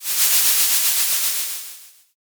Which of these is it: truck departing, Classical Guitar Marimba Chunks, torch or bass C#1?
torch